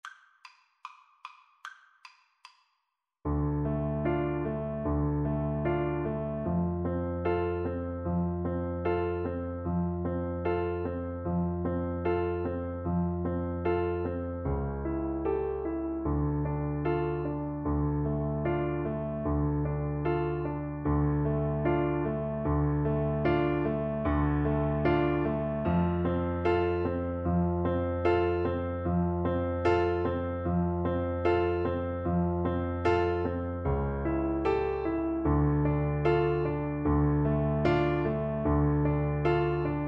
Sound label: Alto Recorder